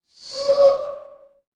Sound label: ui send